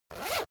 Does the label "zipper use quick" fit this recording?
Yes